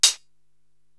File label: HH Old Crisp Close, Korg TR-Rack drum machine